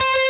prebend full Left-hand vibrato

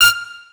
Leed Melody

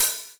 Hat Open

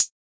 soft hit normal

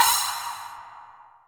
crash; osu

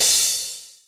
Cymbal G

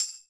soft hitnormal

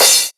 crash; osu

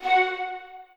strings

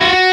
bend full

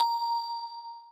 bell

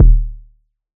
kick

